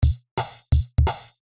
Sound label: Drums; D n B